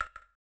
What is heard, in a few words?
List Startpoint, system; Sony Ericsson Xperia X5 Pureness